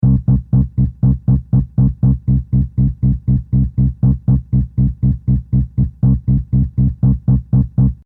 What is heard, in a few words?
bass